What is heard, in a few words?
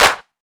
verby hand clap f key, tuned percussion